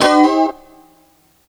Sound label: D 4, Funk Chords; Guitars